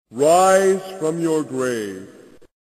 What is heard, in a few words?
notification sound